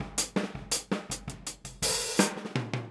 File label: Boogaloo Fill Hi-Hat, 82 BPM, Drums